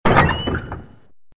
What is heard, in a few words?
Open Chest